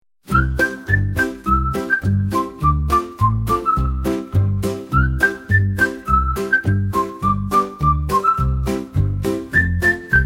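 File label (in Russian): весёлый свист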